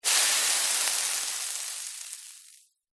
nature water hit hot metal sizzle bubble

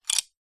stationery pencils in hand clutch; zapsplat

